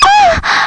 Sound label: Moan; MacFoxes Adult Game; Girl1Lockeroom